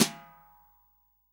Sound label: SD, REAL DRUMS, SWING KIT